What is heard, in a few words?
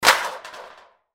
Metal Sheet Drop, Metal Sheet Drop On Cement; Materials; Foley; Blastwave